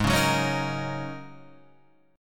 Ab 9th Suspended 4th Ab down Guitar Half Step Down; Ab9sus4